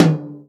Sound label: tom, Real Drum Kit, jungle kit